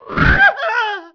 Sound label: tornado; tornado man; Emperor - Battle for Dune